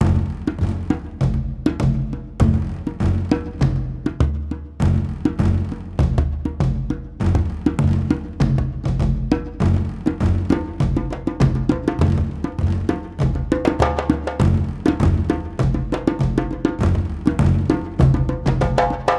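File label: background music; Pirates of the Caribbean Dead Man's Chest; PlayStation Portable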